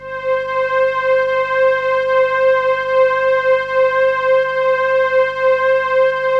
pad, C5; USB Soundscan vol.05 - Explosive Jungle; AKAI

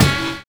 HIP SAX; Univers Sons

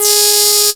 CREEPY G#3, sequencer; Best Service Alpha Dance vol.2

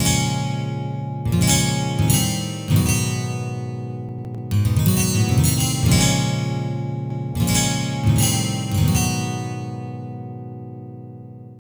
12 String Guitar

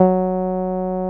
SIEL, String Machines

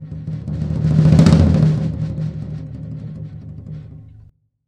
roll, beats, rolls fills; Sonic Foundry (Sony Creative Software); World Pop

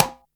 Boss DR-660 Perc, Drum Machines

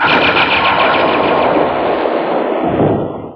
Ancient Noise FX, Industrial

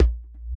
EQ Perc, Percussion Mix

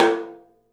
3D Percussives, Studio Kit; E-MU Producer Series Vol. 5 – 3-D Audio Collection

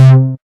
DD SYN, Disco House

